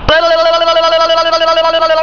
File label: heckle